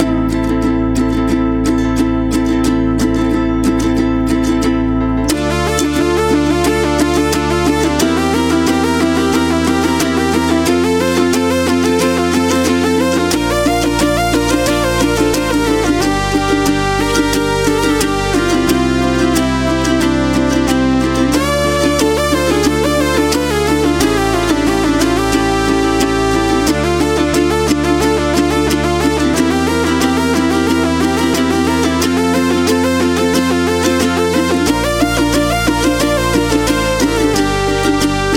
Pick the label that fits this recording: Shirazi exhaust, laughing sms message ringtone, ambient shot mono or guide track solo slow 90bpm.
guide track solo slow 90bpm